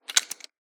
coins handling; soundscrate

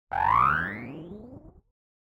Rotor Charge Up; SoundsCrate

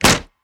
monster chomp; soundscrate

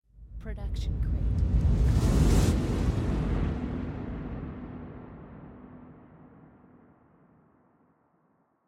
trailer riser; soundscrate

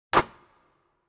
basketball swish

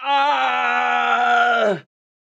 man injured groan long; soundscrate

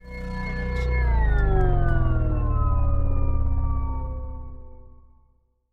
synth fall; soundscrate

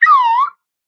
slide whistle single note

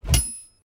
Knife Clash; soundscrate

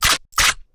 Shotgun Reload; soundscrate

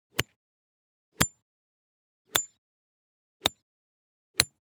golf club rescue stereo; rescue 5; soundscrate

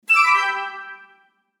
piccolo phrase

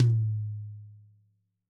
tom hi; soundscrate

